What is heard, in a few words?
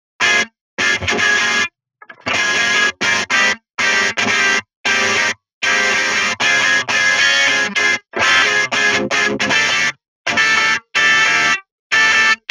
gtr